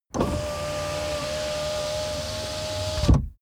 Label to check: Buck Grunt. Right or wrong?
Wrong